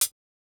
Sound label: hihat, house